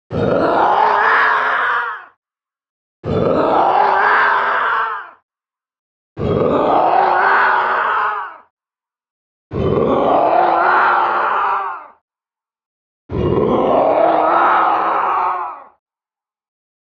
Raging Madman Zombie Beast

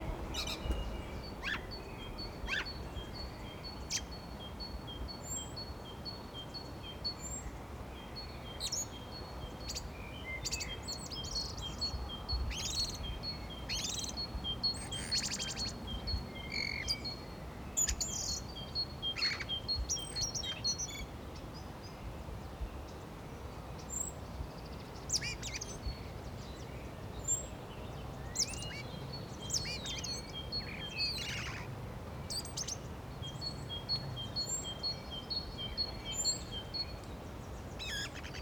Birds Spring Mono

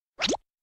Shlup

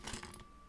Open chest

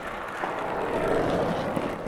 Rollin

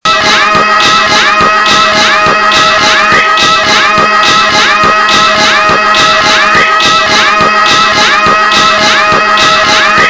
Crazy Yelling Polyphonic Vocals With A Beat

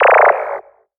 Moog

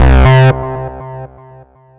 Acid 120 Bass And Mid

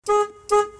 Honk Honk High Pitched Car Horn

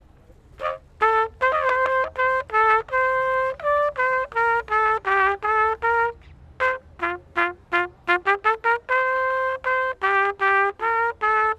Broken Trumpet

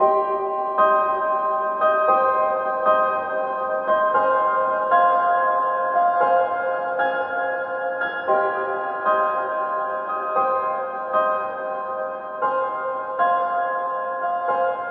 Cinematic Piano Dream Sequence Piano Only

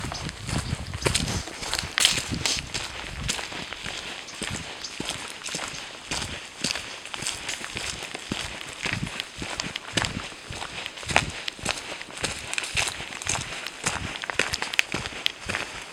Walking In The Brush

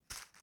Paper Crumple

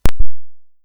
Plug In A Microphone

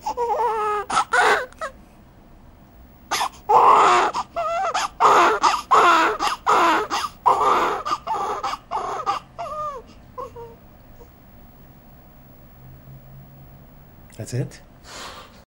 Baby Birth First moments Cry

